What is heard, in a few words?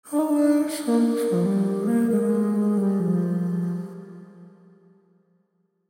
Vocals plate; Reverb einstellen ist wichtig in der Musikproduktion